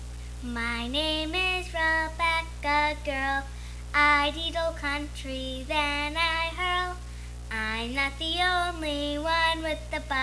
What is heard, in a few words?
Old Country